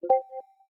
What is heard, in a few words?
MMS, ui; Galaxy Note 9